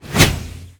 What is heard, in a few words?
nex whoosh